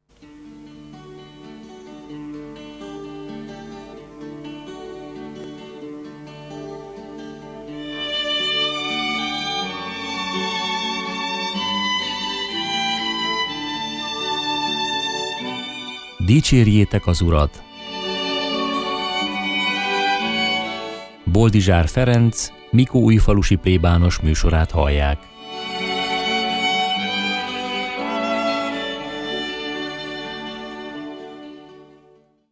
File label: Jingle; Dicserjetek az Urat